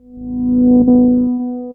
Sinus rise; instrumente

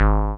Sequence Bass

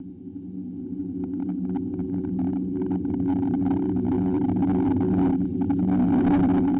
Horror Rise

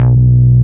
Short Layer, baesse

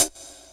Rim & Crash

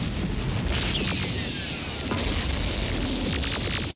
Ghost Guns

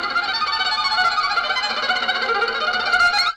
Violin FX

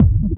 Fat Reverb BD

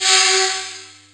Dramatic; instrumente

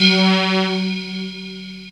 Digital Fly; instrumente

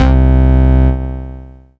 Square Wave, baesse

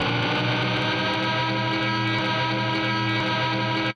Alert

